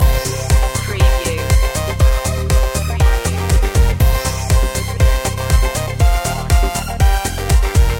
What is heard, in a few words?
dance floor loop